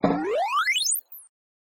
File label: energizer; kapman; games